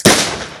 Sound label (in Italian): Bomba